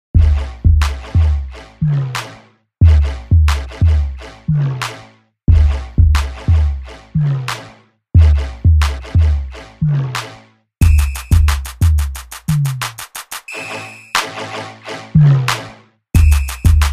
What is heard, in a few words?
PIT STYLE KIT; YANG